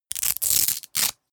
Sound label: velcro undo